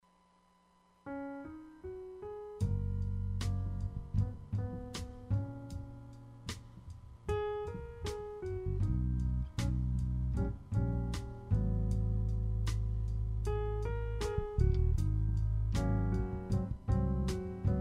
jazz

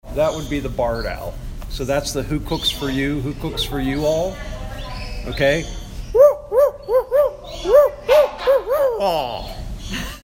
owl call

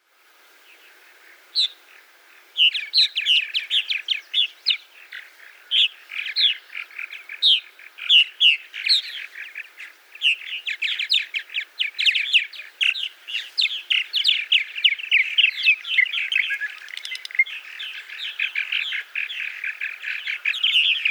purple martin; paul marvin

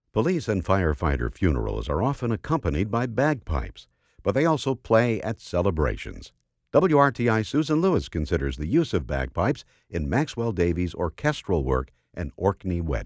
Bagpipers An Orkney Wedding

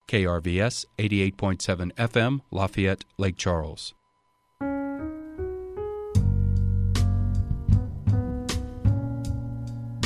jazz

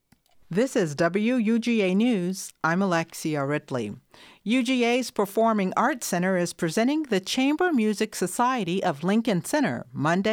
string quartet; emerson string quartet; athens

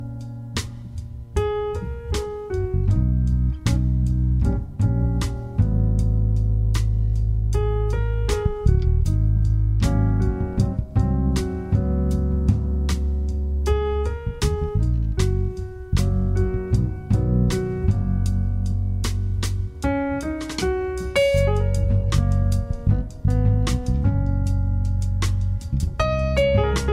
jazz

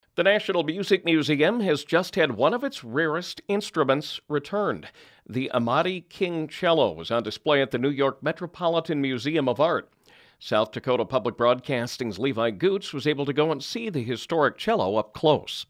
cello intro

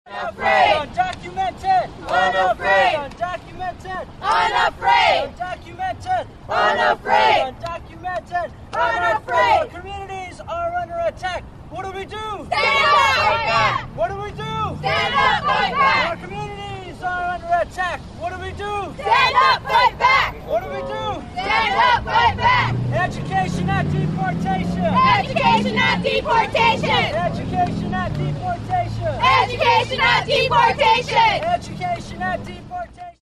chant

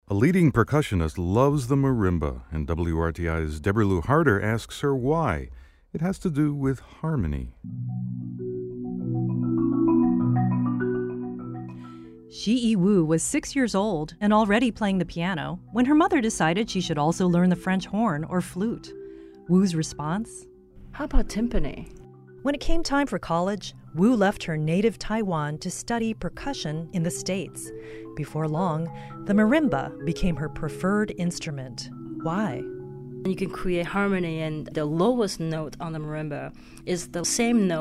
Marimba

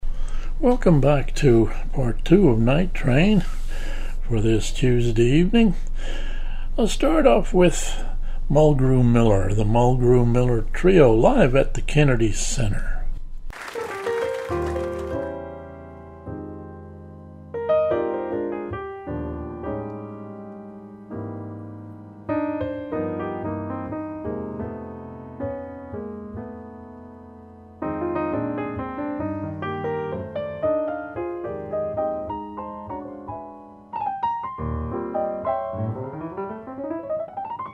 jazz

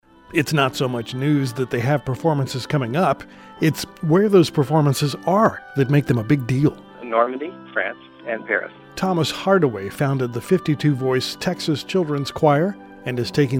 Children Choir; TX

